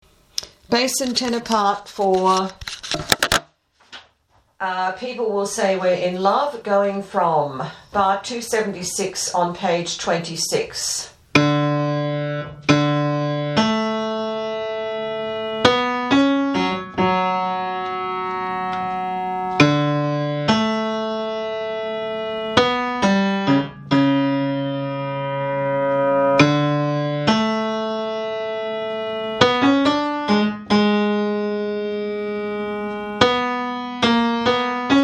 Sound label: tb, tenors; Oklahoma